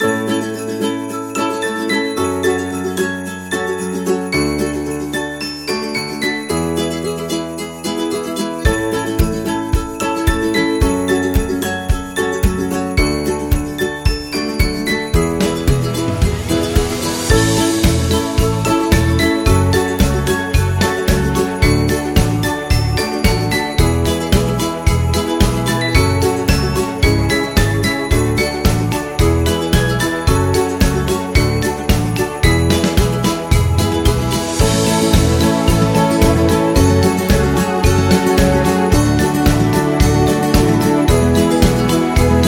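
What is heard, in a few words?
Fun Love, Happy and cheerful background music for celebration videos, birthdays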